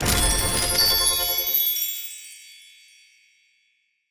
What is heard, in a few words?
coins